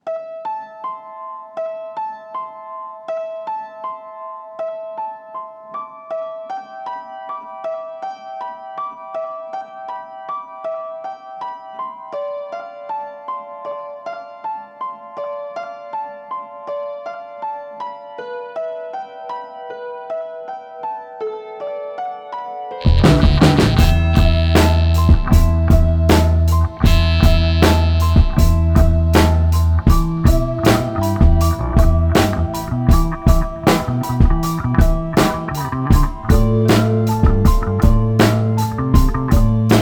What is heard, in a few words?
interlude instrumental